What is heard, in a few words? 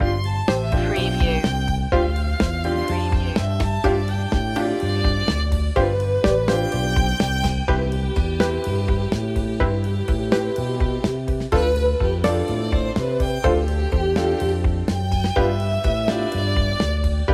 carefree day out loop